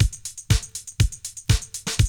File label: break; ZERO-G; Datafile